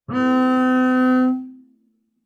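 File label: Bass arco fortissimo sul D B3, Double Bass; MIS Pitches - 2014; Strings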